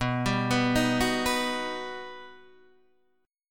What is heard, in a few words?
G Augmented Major 7th